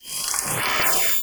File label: Scythe Heavy Attack Charge; Weapons; Melee; Warframe; Archwing; Exalted Archwing Scythe